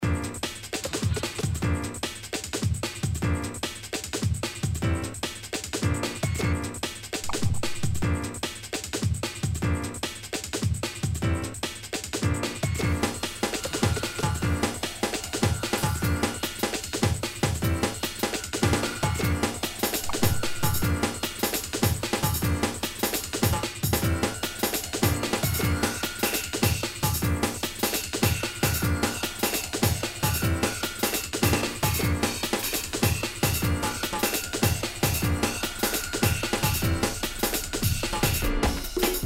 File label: piano bar